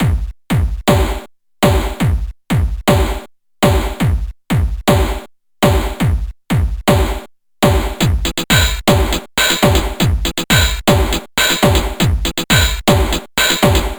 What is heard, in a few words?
Soulsby Oscidrum Drum Set